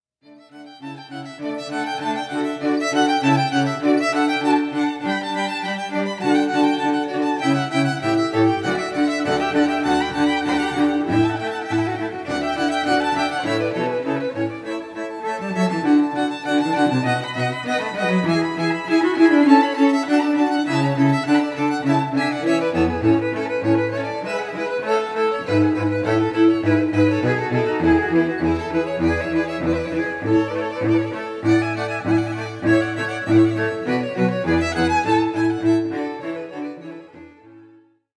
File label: String Trio; Tartinis D Major Sonata